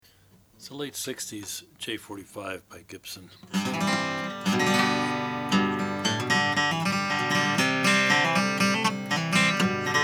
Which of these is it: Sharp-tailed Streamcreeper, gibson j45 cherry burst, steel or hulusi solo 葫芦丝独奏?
gibson j45 cherry burst